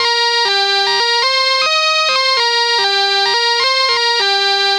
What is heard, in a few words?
Arp C Line, ARP Odyssey; Lines; synth; musicradar-synth-samples